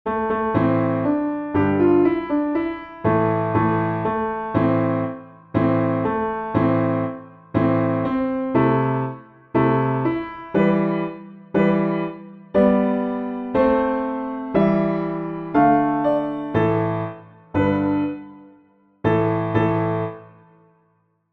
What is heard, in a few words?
piano backing; tuba piano extra